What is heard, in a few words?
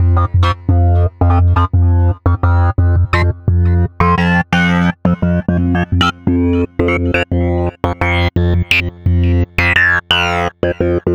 Synth Bass